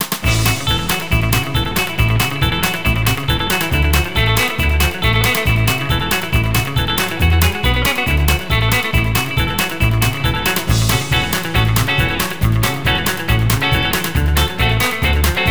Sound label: Country Swang Guitar